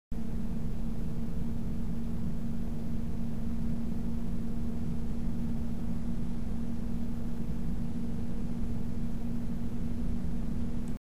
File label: Swiftech Helix 120BW 12V